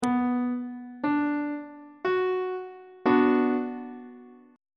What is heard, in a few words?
c flat minor chord on treble clef